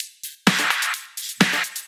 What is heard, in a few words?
Electro Loop 128 BPM; Vengeance Samples